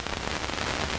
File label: static light; cstrike; D26 slender